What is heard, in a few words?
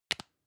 lipstick close soft; haus-garten; badezimmer